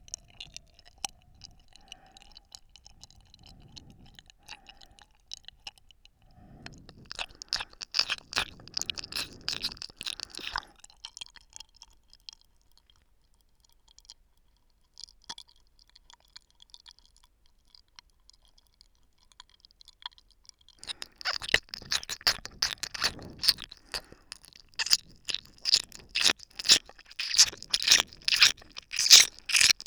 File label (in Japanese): 左耳 深め